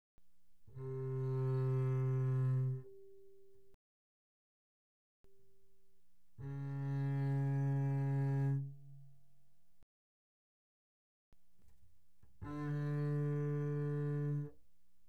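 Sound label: Bass arco mezzo forte sul A C3 A3, doublebass; Strings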